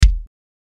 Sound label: Kick Drums; کیک درام